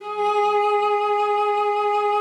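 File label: G#2, cello vibrato; Xsample VOL-7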